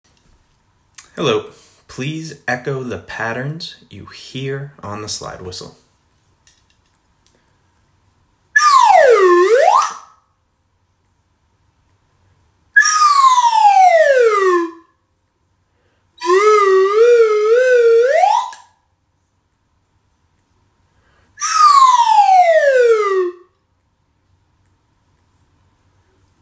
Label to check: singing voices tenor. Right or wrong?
Wrong